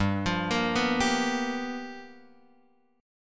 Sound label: G 7th Sharp 9th G chord, Guitar Open F; G7#9 arpeggiated; JGuitar